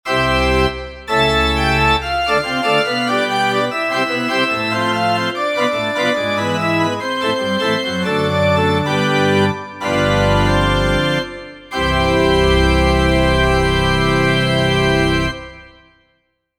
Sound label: church; cms weihnachtskalender 2023 freeware